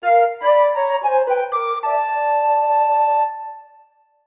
flute

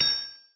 Power Refresh; Kingdom Rush Vengeance; tower defense

